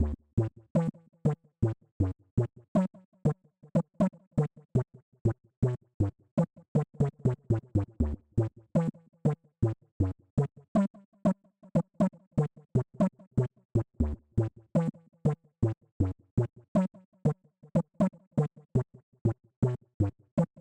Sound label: Buzz Bass